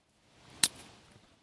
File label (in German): ast knackt